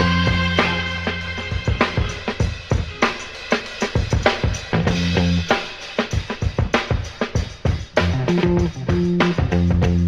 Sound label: break, Drum loops Funky